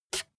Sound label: bmx bike tire foot scuff medium